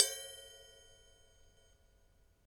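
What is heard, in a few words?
ride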